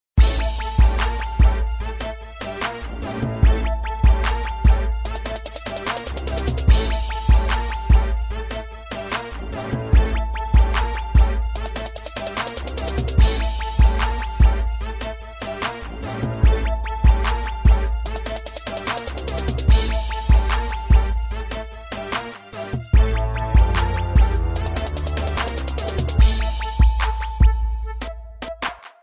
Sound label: hip hop beat